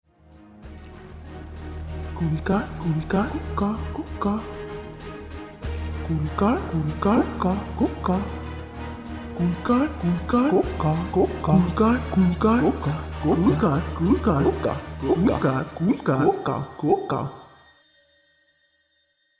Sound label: cool car